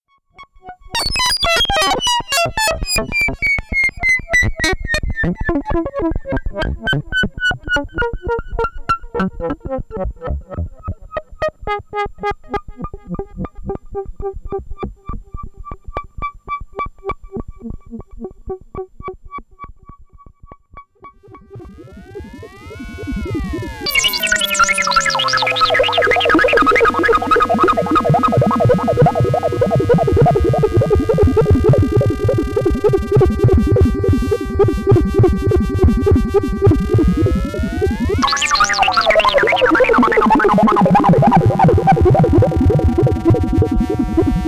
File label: Sonic Six FX